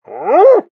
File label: pyro taunt exert; tf